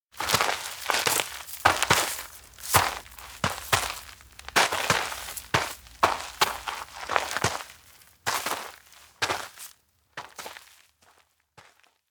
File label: steps outdoor mountain road climb chase; awayuki; anemoi